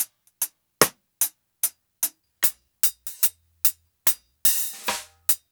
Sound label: TING LOOP 74; AMG Gota Yashiki Groove Activator